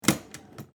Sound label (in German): S Bahn Fensterklappe